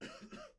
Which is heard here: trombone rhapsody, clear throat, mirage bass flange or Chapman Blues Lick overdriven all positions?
clear throat